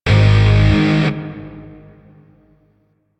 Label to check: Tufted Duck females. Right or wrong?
Wrong